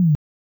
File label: player beat to free sword back